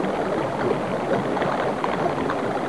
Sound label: stream